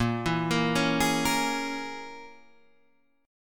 A sharp Minor 7th Flat 5th; 6,7,8,6,9,6; arpeggiated

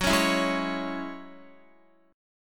Gb 7th Suspended 2nd Gb down Guitar; Gb7sus2 strummed; 4E,4A,5D,5G,5C,6F

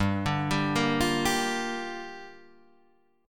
G Minor 7th, Guitar, Half Step Down

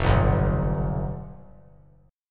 F Suspended 2nd Flat 5th, Fsus2b5 chord; strummed; JGuitar

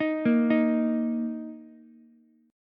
D sharp 5th, Ukulele; arpeggiated; D#5; 8,10,11,x; JGuitar

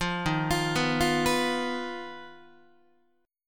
D sharp Suspended 2nd Sharp 5th D sharp Guitar; arpeggiated; JGuitar

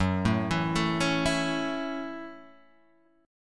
Gb Minor 7th

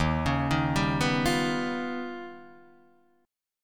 D sharp Augmented 9th D sharp Guitar Open D

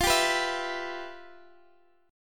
E Minor F, chord; Em/F; strummed

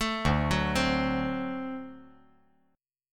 D 7th, down

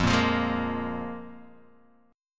F Minor Double Flat 5th, Guitar, Open D

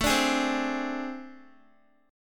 A Minor Major 7th Double Flat 5th, down, Guitar; AmM7bb5; strummed

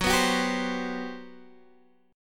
G Major 11th Gb

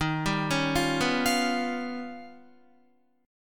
Eb Augmented 9th Eb down Guitar; Eb+9 arpeggiated; JGuitar

